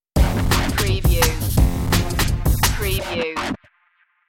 high contrast stinger

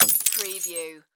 Glass Bottle Drop

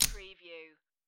Poker Chips Drop Two Chips Onto Another Two Chips

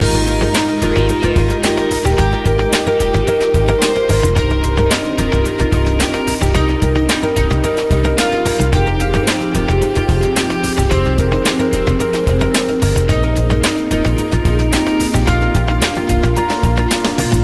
bright and corporate loop

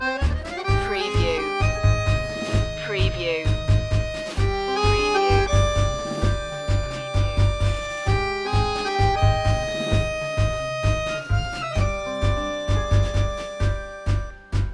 tango loop

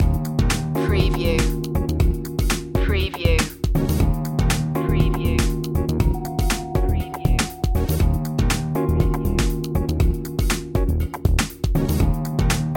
fizz loop

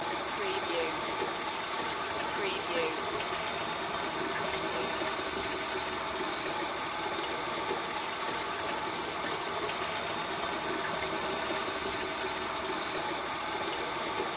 Pipe Flow Through

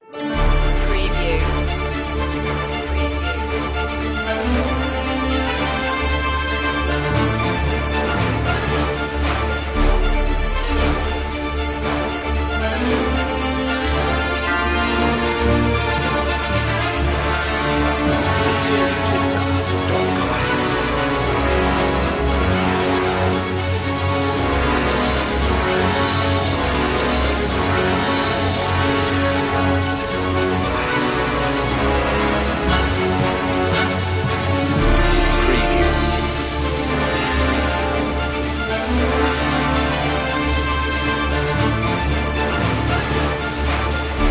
march no choir

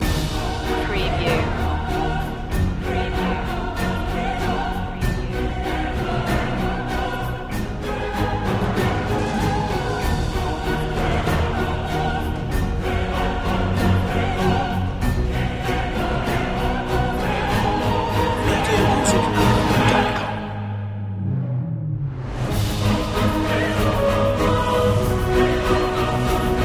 full mix

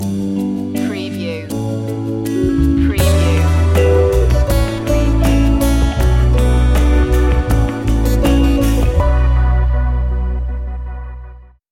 time to relax stinger

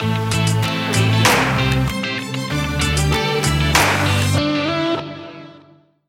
lavish lifestyle stinger

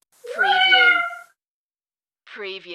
Cat Meow

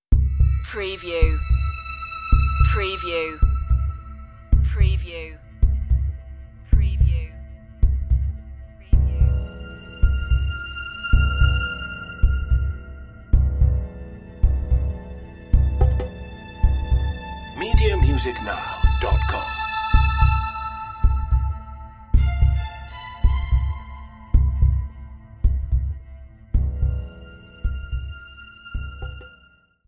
heart beat nerve

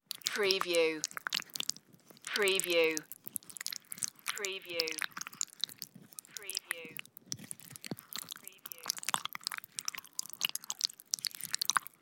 intestine squeeze

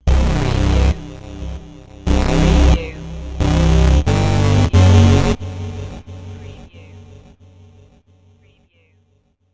Deep n Creepy

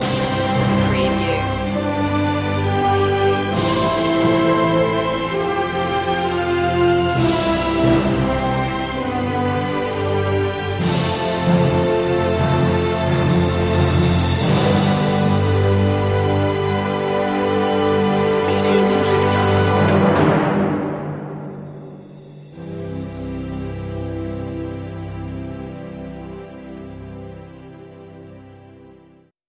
orchestra mix

